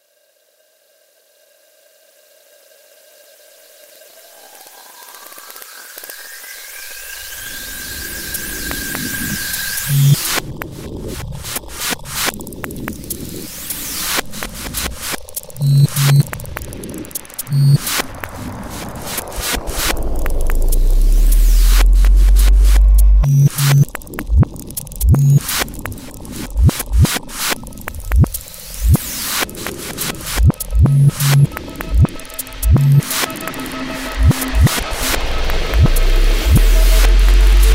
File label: ambience